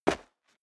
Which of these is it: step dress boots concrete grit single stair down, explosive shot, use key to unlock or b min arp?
step dress boots concrete grit single stair down